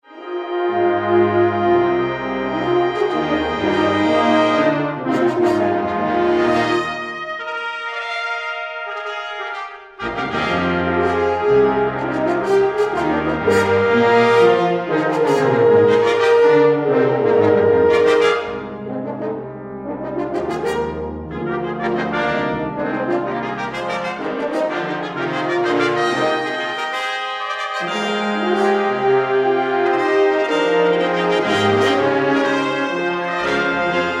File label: Fanfare